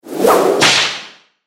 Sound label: Whip Crack Bullwhip Impact Snap Reverb; Whoosh; Warfare; Blastwave